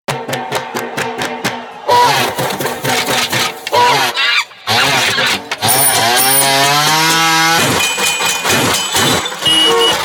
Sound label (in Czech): Potlesk; Deaflympic; CZ